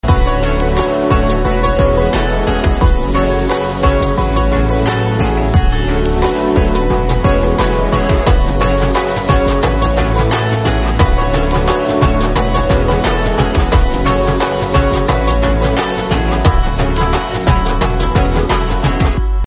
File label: Instrumental, Telugu Ringtone